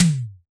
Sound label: hip hop kit mid tom